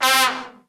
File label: SECT BRAS FALL